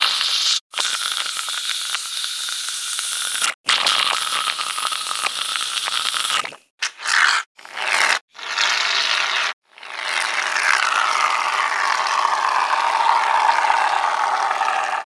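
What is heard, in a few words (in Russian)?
крем для бритья выдавливают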